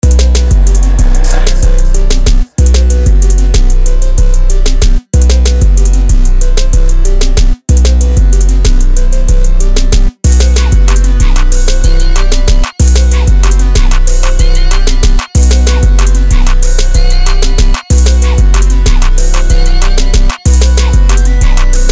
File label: 188bpm